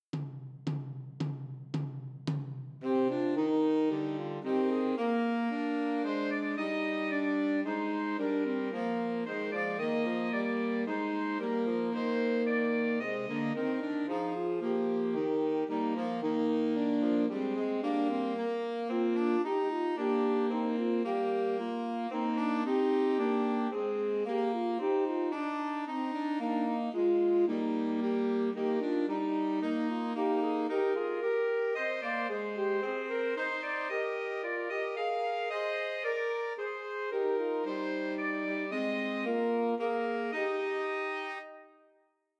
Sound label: Two Altos and Tenor Saxophone; Christmas Trios